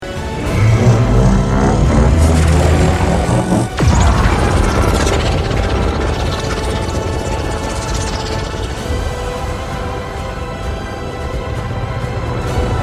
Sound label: godzilla charging; evolved